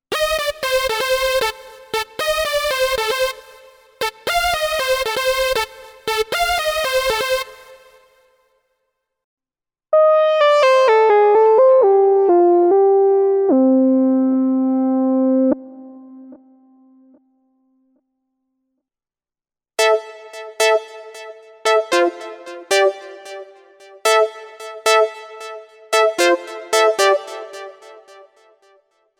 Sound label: Yamaha PSR SX700 SX900 Lead Sounds